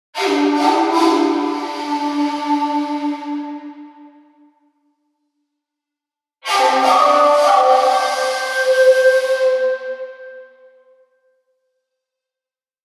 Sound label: amazone flutes